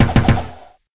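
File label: distance shot bow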